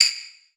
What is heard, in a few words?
Multimedia Internet CD-Rom Flash Ding